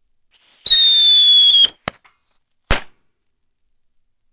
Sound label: bottle rocket